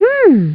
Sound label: humm; BattleSNDS